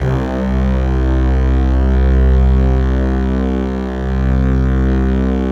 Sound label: PAD, ATMO PADS; Best Service; XX Large